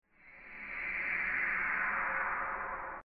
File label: teleports charge